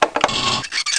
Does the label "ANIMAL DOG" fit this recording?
No